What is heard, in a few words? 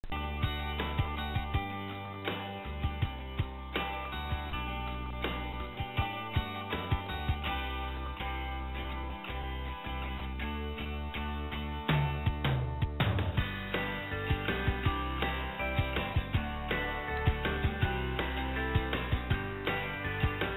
music on hold; Paypal